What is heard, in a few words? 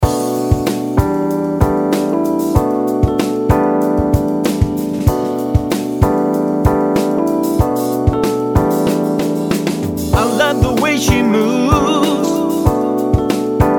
Vocal Attack; WRE Bundle; Vocal Attack 3